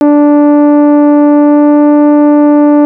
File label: Theremin Vintage; Atmospheres; Ableton Foundation FreePacks